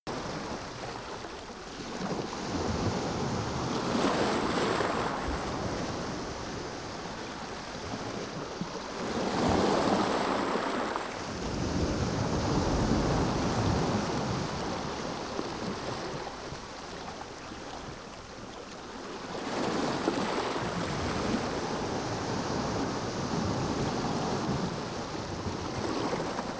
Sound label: coast loop beach soft